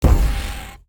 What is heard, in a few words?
Heartball Impact Hacked